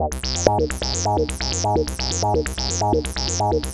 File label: Lead